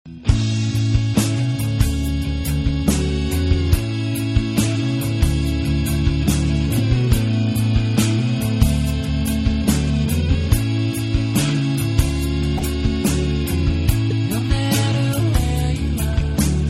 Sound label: Smash Drown, ebow